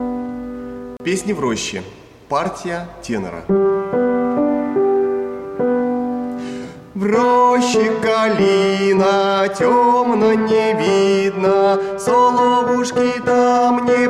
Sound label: tenor